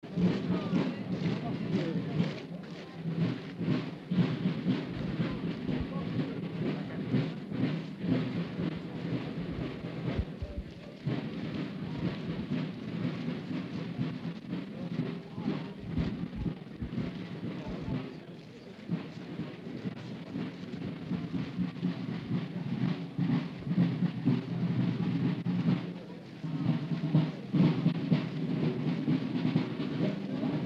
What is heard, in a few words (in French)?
Marche tambours et fanfare